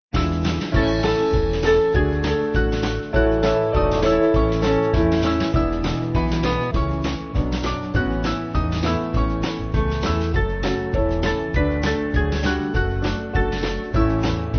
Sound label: Band, Music Backing; It Passeth Knowledge; Ephesian; 5/A-Bb